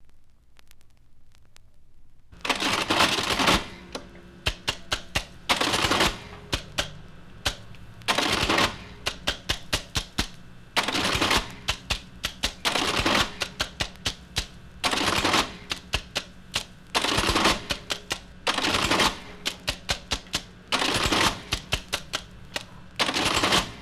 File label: National Cash Register Electric Adding Machine